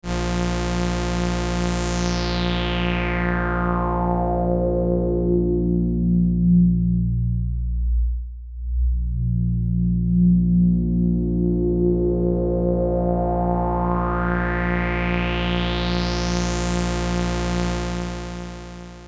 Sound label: LP BP manual Sweep